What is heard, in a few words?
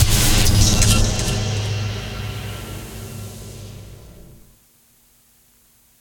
impact; sonniss gdc